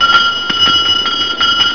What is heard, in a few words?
HANDBELL